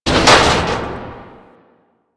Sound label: Military Checkpoint Metal Hit